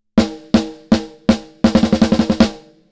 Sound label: snare; SplitEQ